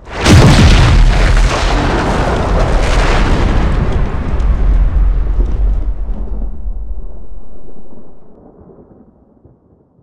explo; trititan upgr; tritupgr

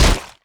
melee attack impact; The Monster Plane; kolmods melee

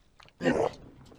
damage; trevor henderson

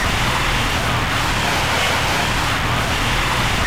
static; Garry's Mod; huggy wuggy snpc; slender; corrupted woody

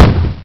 punch stomp headbutt bite; The Monster Plane; kolmods melee

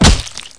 hand hit; haggy wuggy bot

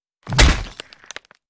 hunter axe hit; zoochosis; warthog

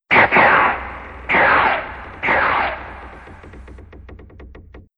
alert; househead nexbot

